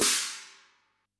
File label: GONG, Yamaha DD-65